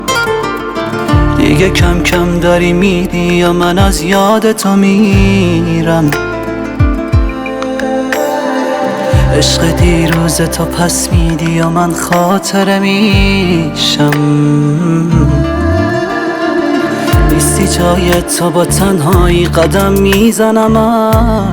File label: solo